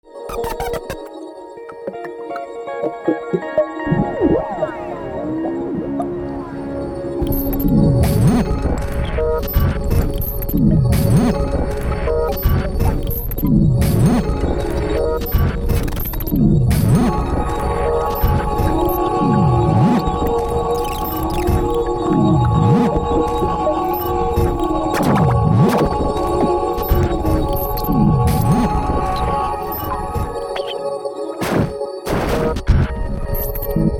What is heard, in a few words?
SYNTH